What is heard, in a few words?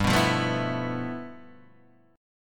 G 13th G, chord